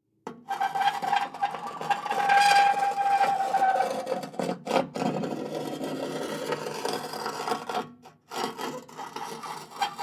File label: rusty metal